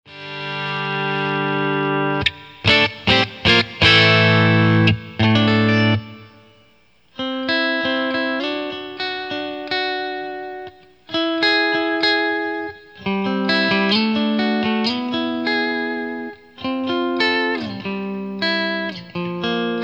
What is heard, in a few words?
Clean, Cyber Deluxe